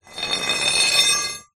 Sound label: foley metal pole small thick scrape concrete; zapsplat